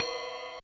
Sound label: Kit Ride, Realistic Concertmate-670; One Shot Kit